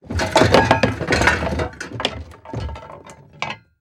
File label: Impact Metal Can Debris Fall Down Wooden Stairs; Impacts